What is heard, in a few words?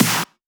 Snare; Vengeance Samples